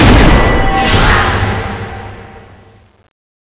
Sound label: weapon change